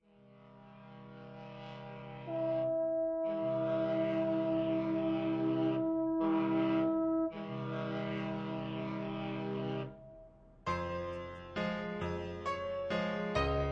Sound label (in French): Musique d ambi; Musique d-ambiance